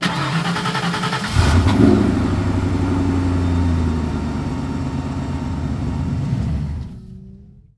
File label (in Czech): auto start chcip; Mafie 1